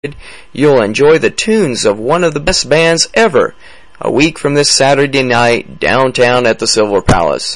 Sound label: Good Old Blues